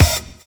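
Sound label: OLD GROOVE; Univers Sons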